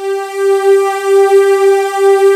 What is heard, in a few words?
KILLER, pads